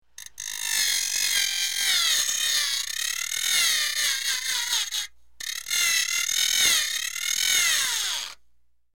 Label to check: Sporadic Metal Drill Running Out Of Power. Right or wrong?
Right